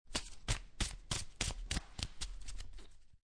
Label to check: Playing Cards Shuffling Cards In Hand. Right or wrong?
Right